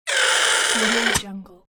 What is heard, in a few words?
object sucked into vacuum